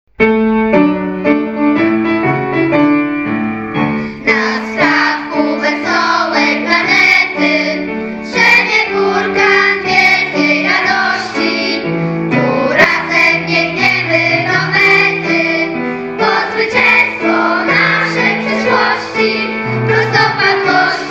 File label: hymn; sp3 zamosc